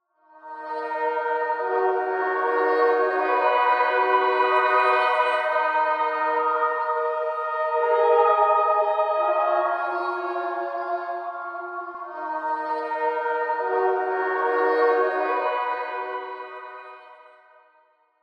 Pads

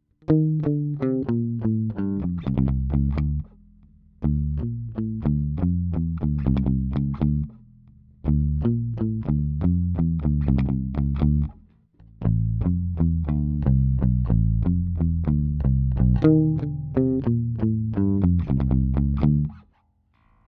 Both pick rolloff muted

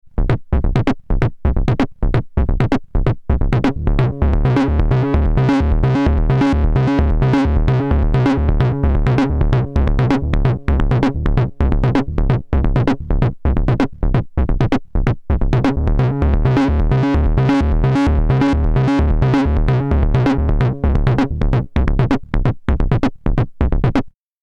Synthesizers VCF Feedback Dry

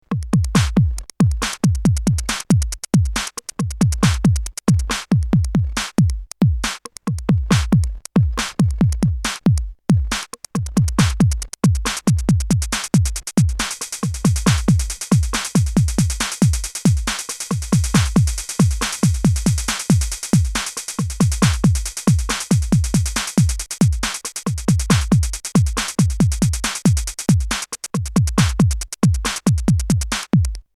AKAI MPC60

